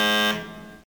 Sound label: Wrong Answer; quiz gakumon no susume arcade gamerip 1993